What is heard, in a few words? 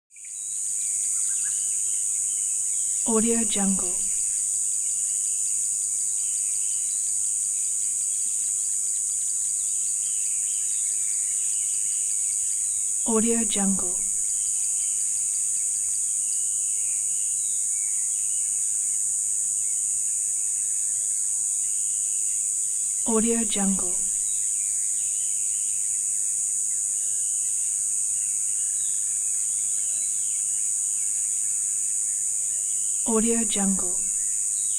atmo bush river dawn insect close; smartsoundfx